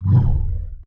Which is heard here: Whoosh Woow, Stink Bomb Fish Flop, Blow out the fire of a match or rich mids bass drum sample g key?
Whoosh Woow